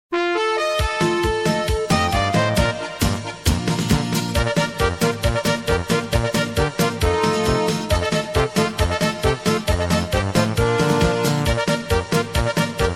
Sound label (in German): Trompeten Echo